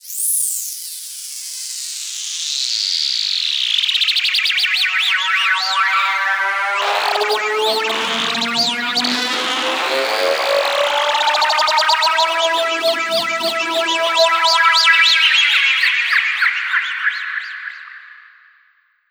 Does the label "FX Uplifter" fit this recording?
Yes